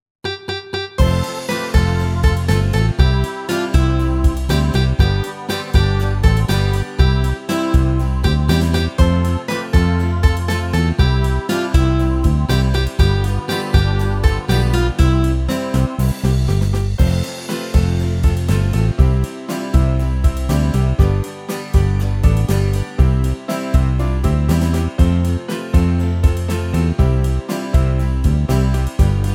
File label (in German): Geburtstagslied Karaoke